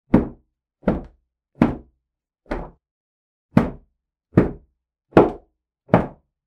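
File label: towel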